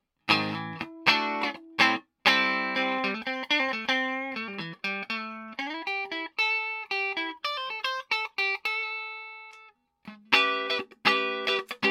CLN, preamp

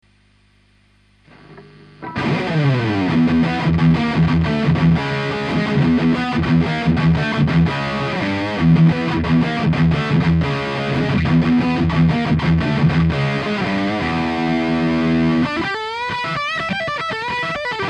distfull, preamp

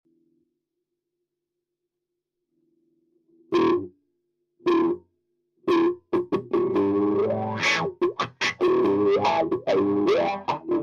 wah; DIY; 60s ods

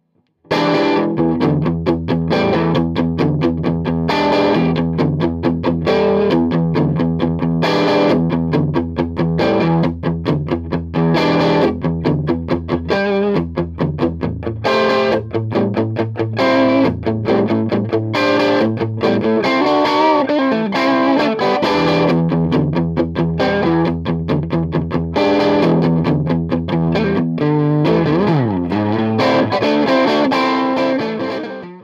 strato

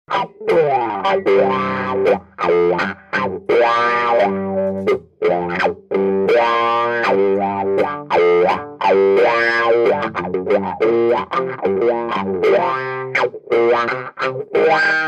strato wah deep on

